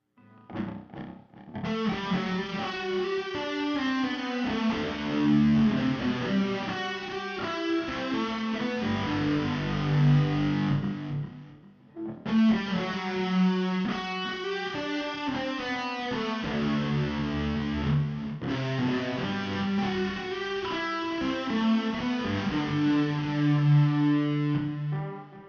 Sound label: Lick, guitar